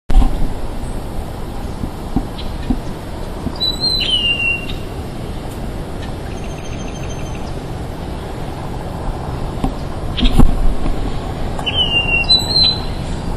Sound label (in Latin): Amblyramphus holosericeus; Otamendi